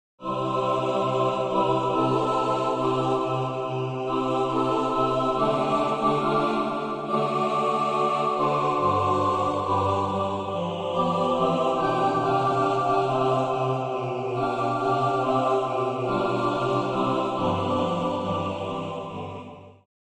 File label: Sacred Motet, Choir; Locus Iste; This Place; Anton Bruckner; Magnus Choir; VST Plugin